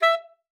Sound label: sax e5, Saxophone stacc piano; Session Instruments